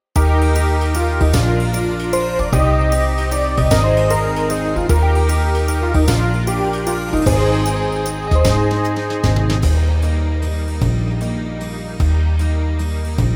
country waltz

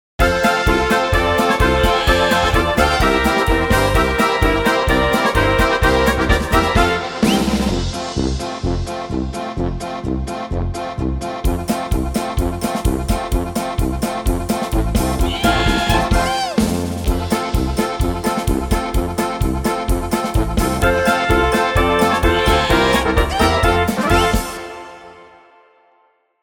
oberkrainer polka

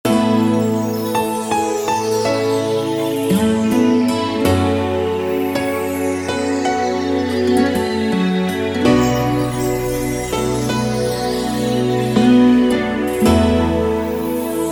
shuffle

